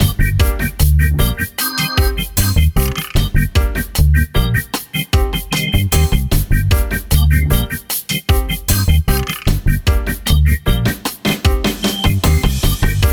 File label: drum; KONG drum